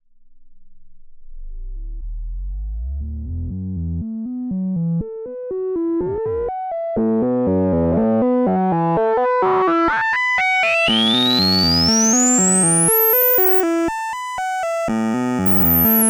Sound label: vcf1 LP sweep Res 100